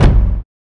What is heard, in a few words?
Shot Blunt; FREE SFX PACK VOL 2